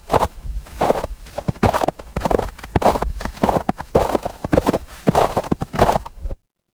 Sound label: footsteps snow